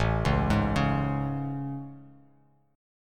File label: A Major 7th A; AM7; arpeggiated; JGuitar